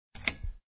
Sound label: button hover; Sports Games; BaseballClassic